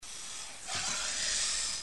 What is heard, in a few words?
snake; animals